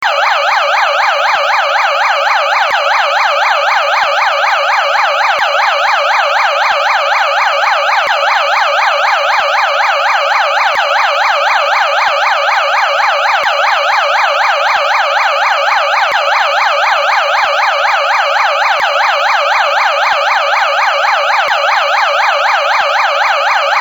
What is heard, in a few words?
normal fire alarm; fire system